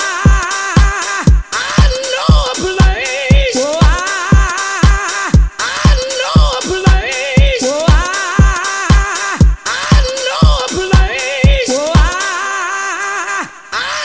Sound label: Mix, 118 Bpm